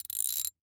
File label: Industry Tool Ratchet Turn Single